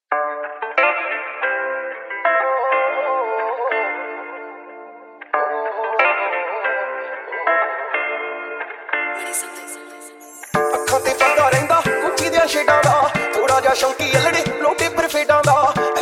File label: Dhol Remix Song